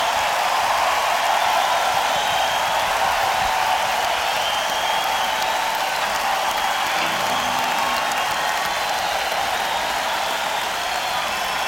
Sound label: Encore Break; sony ecm270